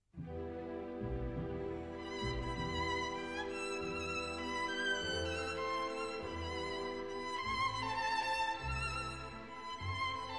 Violin Concerto in E Minor I. Allegro Molto Appassionato